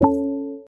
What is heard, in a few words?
nokia ceres ringtone